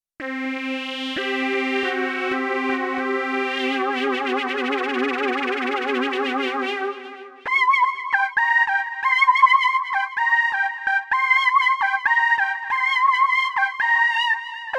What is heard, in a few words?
Deep House LD, Korg Radias-R